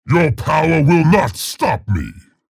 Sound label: pain ability damage; scalebearer; low health